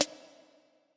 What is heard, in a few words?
Snare